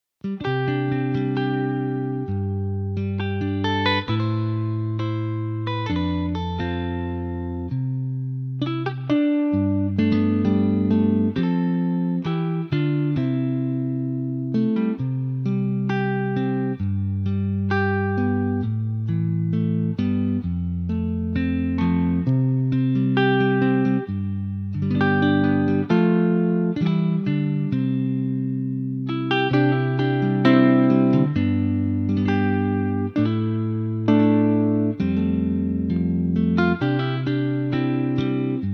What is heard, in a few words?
C Sunburst Gtr, electric guitar